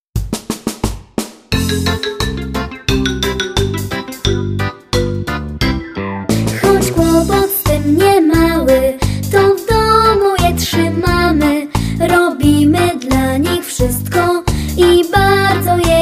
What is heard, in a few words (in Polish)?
o zwierzetach domowych wokal